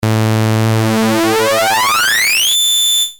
sync, nord

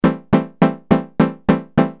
echo; nord; ECHOはアルペジオではなく、鍵盤からのトリガー（打鍵信号）を繰り返す（最大８回）疑似ディレイモードだ

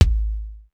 rap kick; sr16 bd

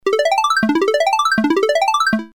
arp up

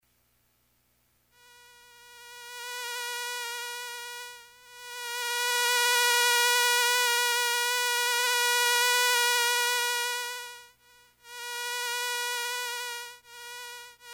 mosquito; LFOを駆使して「蚊の飛ぶ音」を作ってみよう。; OSCセクションのOSC2もLFOとして使っちゃうことにして、基本になる波形にOSC1の「のこぎり波」を使おう; nord3

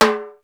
hi timbli, perc; sr16 perc

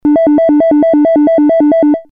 lfo squ; Square（矩形波）; nord

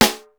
dyno rim; sr16 sd

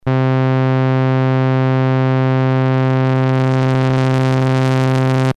mod filt; のこぎり波をノイズ波形で変調したフィルターに通す; FILT （フィルターのカットオフ） OSC2の出力を使ってフィルター１，２を変調する。ノイジーな音作り向き。; nord3

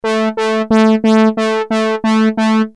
detune

